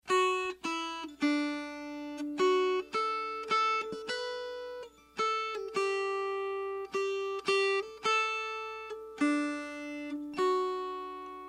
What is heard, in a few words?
Melody; Mohee